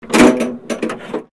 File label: transmission lever, tanks